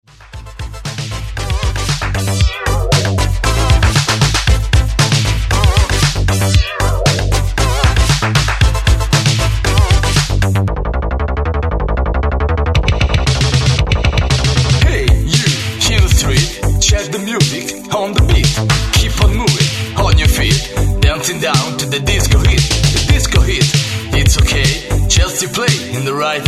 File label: disco hit